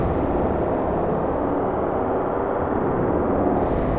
sparse soundscape samples; ReLooped

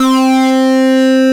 OBERHEIMS

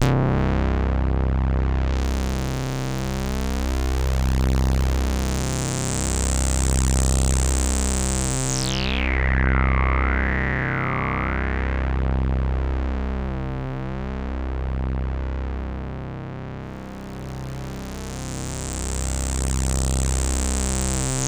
POLY SAW, Roland JV 2080, C1

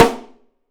Drums, MARCHING; 3D Drums; OP MARCHING

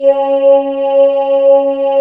VOCODER; Sound & Vision - Gigapack I CD 2

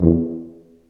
TUBA, Tuba stacc; Roland L-CDX-03 Disk 2

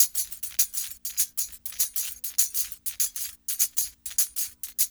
TAMB; East West; Funky Ass Loops

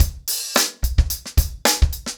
110BPM, acoustic drums; The Roots Drumloops

